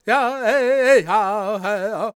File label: C# MIN, World Voices, LAKOTA